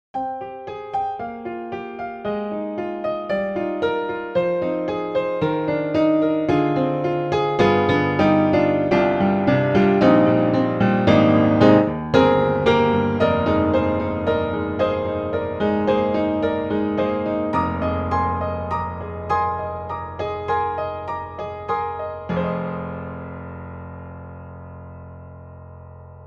Upright Pianos Modern Upright Jazz Club Modern Upright; Synthogy; Ivory II